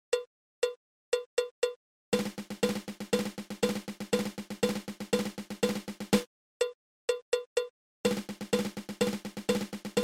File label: Single Dragadiddle